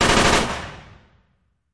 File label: Machine Gun Sngl Rap, single burst; Machine Gun Single Rapid Fire Burst